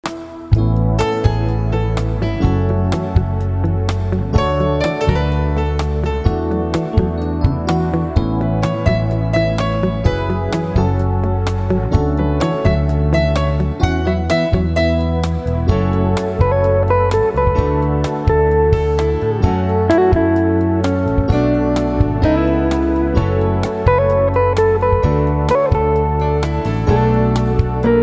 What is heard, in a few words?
light jazz